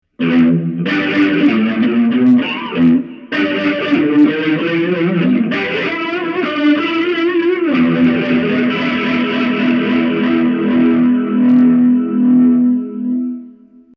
rotary; ME 50

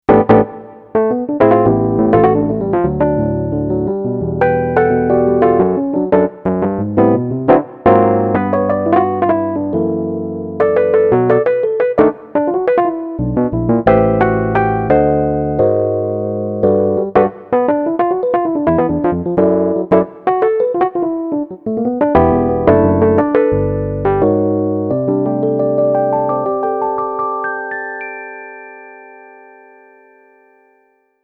el piano

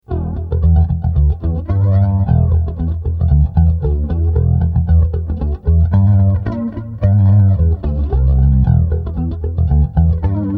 flanger delay

